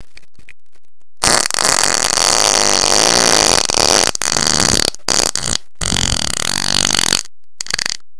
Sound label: fart